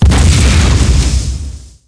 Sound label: explosion; Tribes2